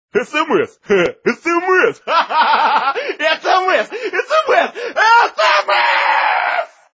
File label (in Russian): sms idiotskiy smeh